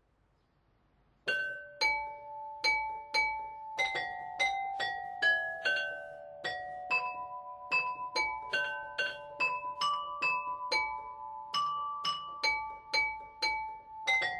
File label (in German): Glocken; Lüneburger; Schäfer und Schäferin